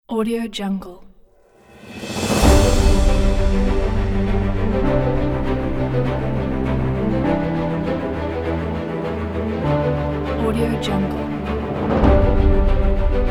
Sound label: inspiring trailer